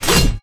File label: p atk dbsword